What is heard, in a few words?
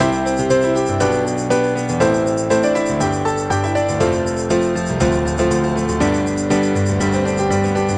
Piano Happy, hold music; Gizmo Project 4.0.4